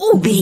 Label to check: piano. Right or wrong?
Wrong